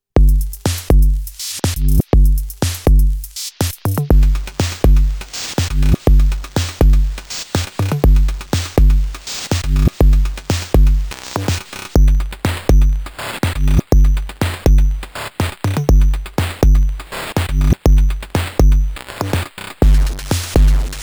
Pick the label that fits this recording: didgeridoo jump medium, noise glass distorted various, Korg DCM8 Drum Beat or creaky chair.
Korg DCM8 Drum Beat